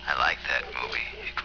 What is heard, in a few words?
scream